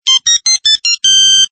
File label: Ringtone; Tony Soprano